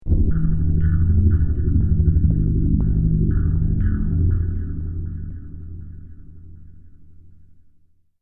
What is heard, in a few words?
Boom Impact Sinister Dark Scary Metallic; Eerie Ominous Horror; Hits